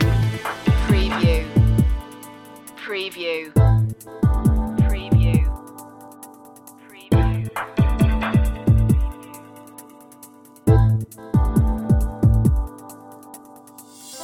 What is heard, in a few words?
in a good mood loop